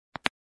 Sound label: Finger Bone Snap